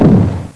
dynamite, cartoon sfx

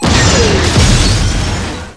explosion

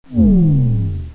plate fall; delirium

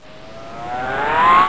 zoom in

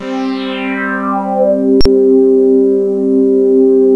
5th saw wave, synth lead; gmplay

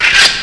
Anunciator; Patton Strikes Back; Patton Demo

